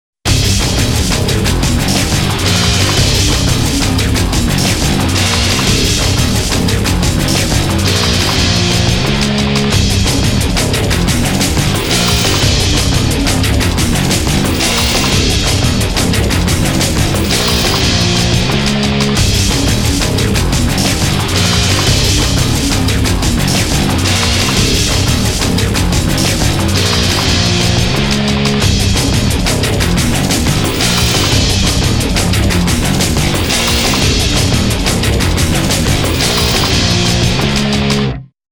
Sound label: JINGLE; Shadow The Hedgehog